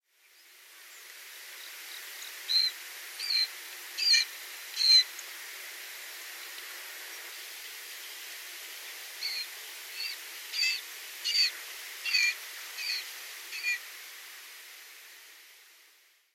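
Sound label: Green Pheasant; kijij; shiga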